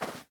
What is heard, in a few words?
Ground short